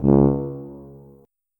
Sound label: tuba note